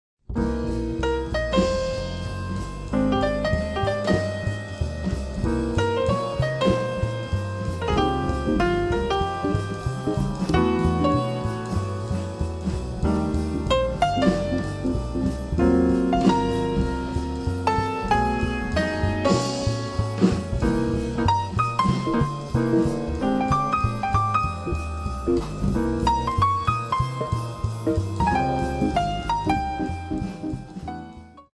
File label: light jazz